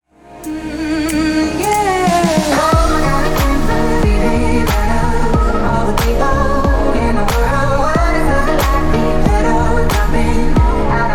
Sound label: slow grooves